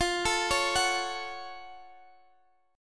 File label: Gb Minor Major 7th F